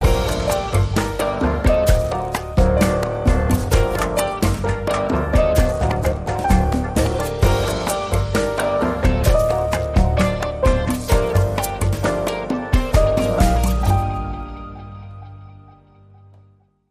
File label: Effortless Latin